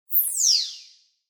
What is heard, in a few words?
Multimedia Internet CD Rom Flash Animation Electronic